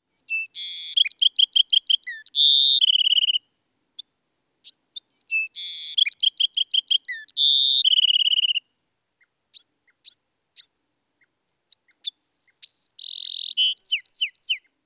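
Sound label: Bewick's Wren song call; UT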